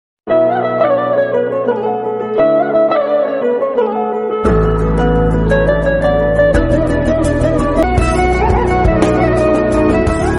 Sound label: instrumental ringtone